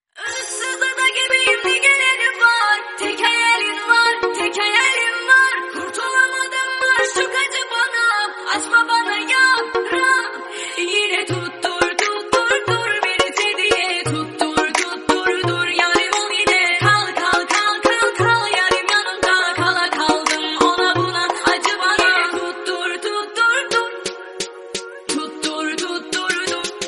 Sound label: tiktok remix